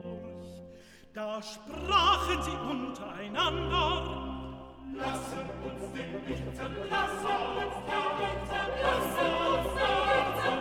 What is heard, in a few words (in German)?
SOPRAN, Stimmen mit Klavier; Johannes Passion